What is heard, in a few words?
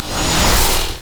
power transfer return; LoL; viktor